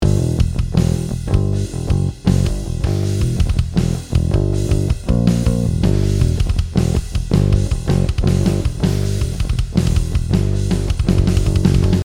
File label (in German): Riff Idee Bass und Drums